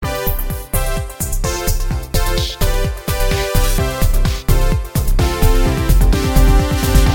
Jump Remix

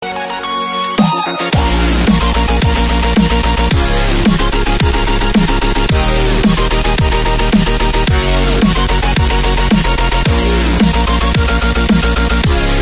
Highscore ringtone

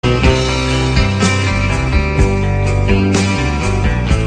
instrumen

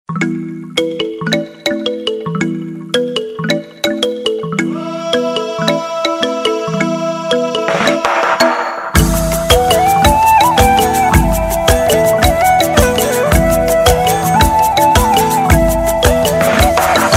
World Music

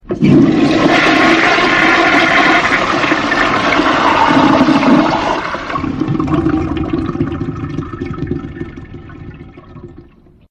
Toilets Flush

